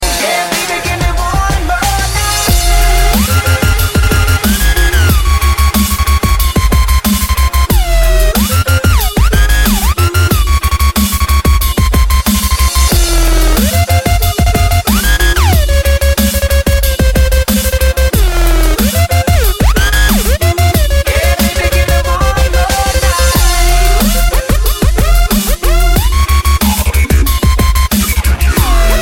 dubstep

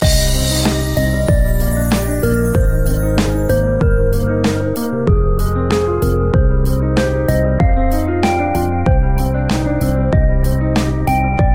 chinese midi; ringtones

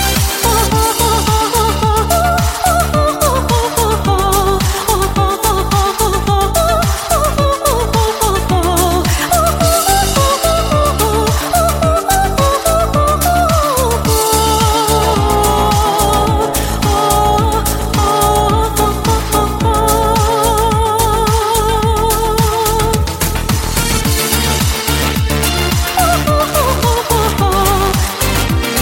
Symphony 40 Dance Remix

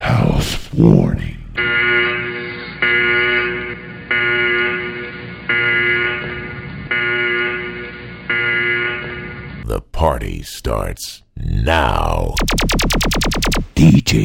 dj jingle

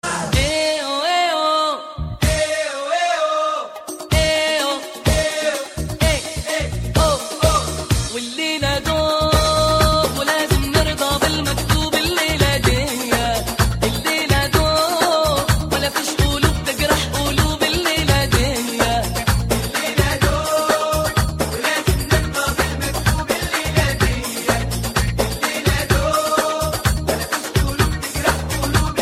ee oye ohh arabic

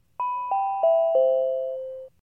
Alarm

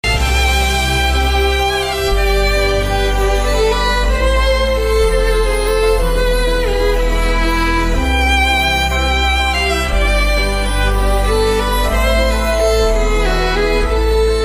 Celtic Christmas

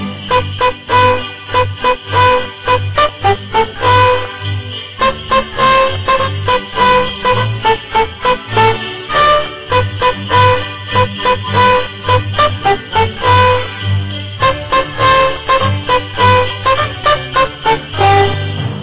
Doot Doot Bells

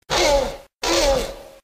velociraptor roaring; ringtones; sms

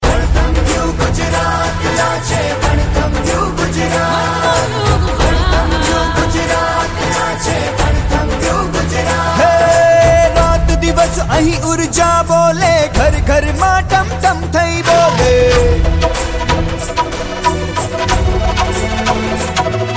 gujarat antheme

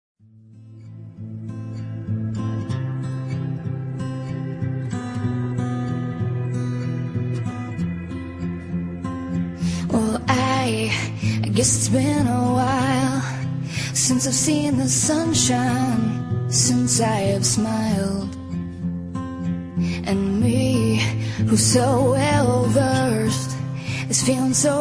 cry

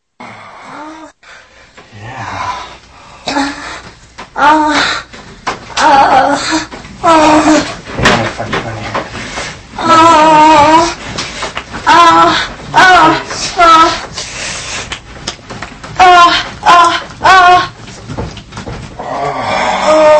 oooooh

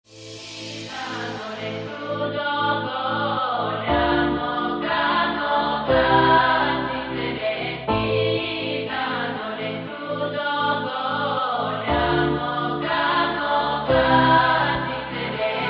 Violin Song Start

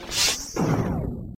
Freezing Power

